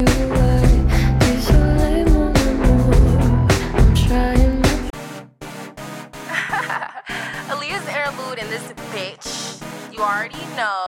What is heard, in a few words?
Interlude